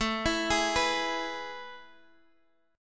A Major 7th Suspended 2nd, Guitar, Half Step Down; AM7sus2

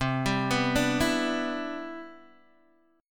C Major 9th, Guitar DADGAD

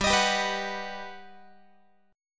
G Augmented Major 7th, Guitar; strummed

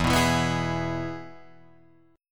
E-Major E, Guitar Drop D

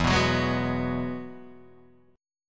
E Major Add 9th E down Guitar Open D

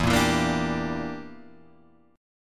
E Minor 7th Flat 5th E

